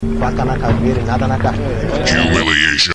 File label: knife